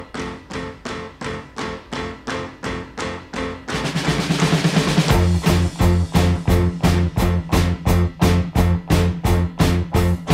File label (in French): accords